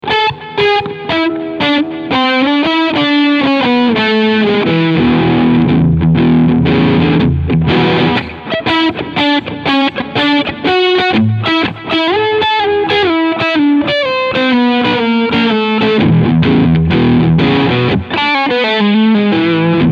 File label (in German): Crunchsound Middle SC